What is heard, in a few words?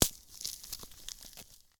ice, Ice Fields